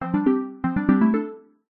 game sound soft dull tone notification

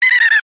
cartoon dolphin laugh; zapsplat

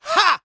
Humans Male Grunt Shout; Screams Shouts 2; soundbits

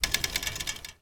industrial barbed wire fence shake

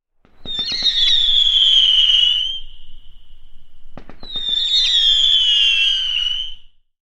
fireworks screamers

